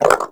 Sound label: Wyrm Idle, Vocals; Warframe; Pets